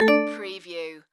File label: Casino Slot Machine Spinning Wheels Two Tone